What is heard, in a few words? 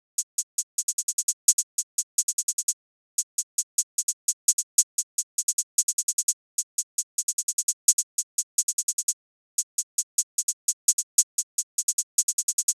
Drums 150bpm Hi Hats Loop Trap